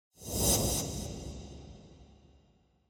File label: Scene Flashback Short